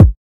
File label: old school nice kickdrum g sharp key